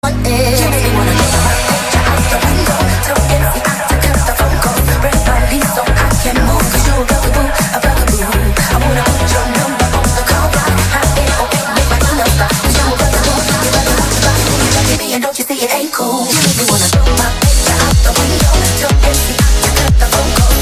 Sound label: electro